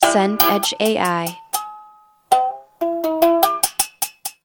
chime music box

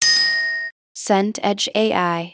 glockenspiel note

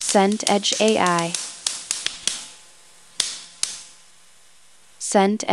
rain on umbrella

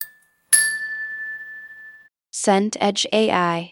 chime glass

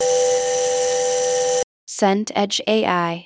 hologram on

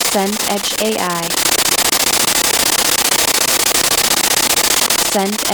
texture data stream

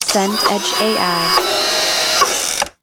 drill into wood

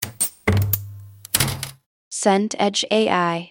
door wood close

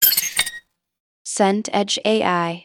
bicycle brake squeal

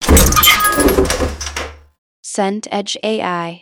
door sliding glass

